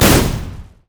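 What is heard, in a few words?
q spell explosion; LoL; ziggs madscientist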